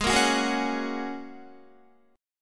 Ab 7th Suspended 2nd Suspended 4th, Guitar, 4E,4A,5D,5G,5C,6F; strummed